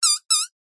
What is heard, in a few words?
cartoon mouse squeak high pitched cute